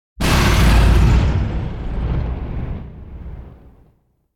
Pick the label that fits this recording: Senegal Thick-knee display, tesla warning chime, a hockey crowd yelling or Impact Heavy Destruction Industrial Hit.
Impact Heavy Destruction Industrial Hit